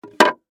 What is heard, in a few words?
Wood Plank Down, Set, Down, 2X6; Wood Sound Effects; SoundEffects-BlastWave